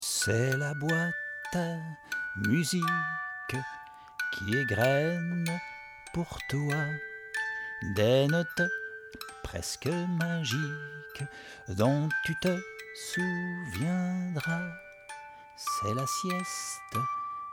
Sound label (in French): boite a musique sieste